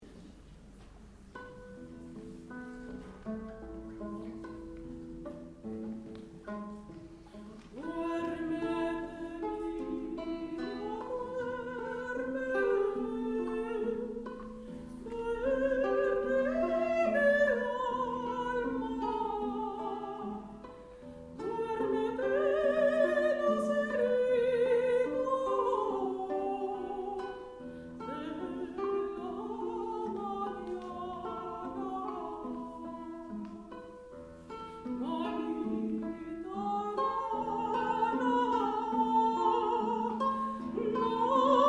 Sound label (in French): Chant Espagnol